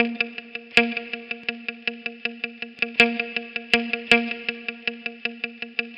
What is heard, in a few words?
080 Roots Reg Guitar; Digidesign; Pro Tools; Pro Tools Essential Demos; Essential Loop Demos; Demo Session - World